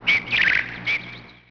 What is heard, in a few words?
amb bird; Tony Hawk's Project 8; XBOX